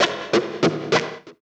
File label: GTR